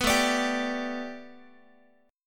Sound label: A 7th Suspended 2nd Suspended 4th, Guitar; A7sus2sus4 strummed